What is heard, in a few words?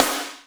Spr Wk S, Boss DR660 sd